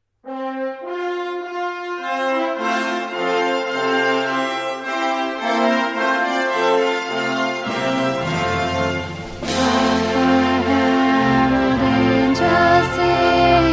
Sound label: Hark The Herald Angels Sing alto, choir